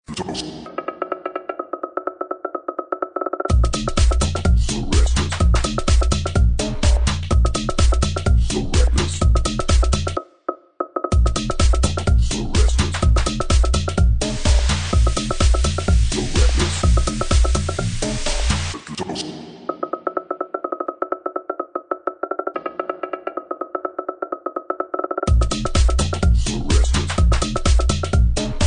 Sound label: Club Minimal